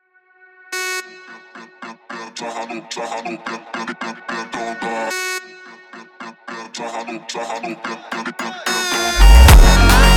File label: Funk slowed